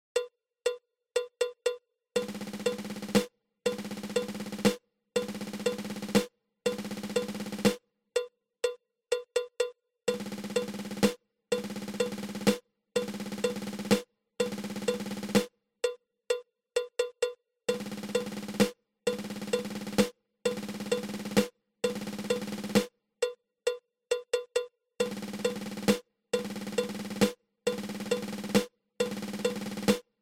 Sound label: Seventeen Stroke Roll